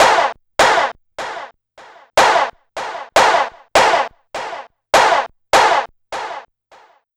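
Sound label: NAKD snare drum, drum loop; Big Fish Audio; Uncivilised G'rooves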